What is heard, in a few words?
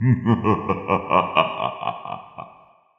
evil chuckle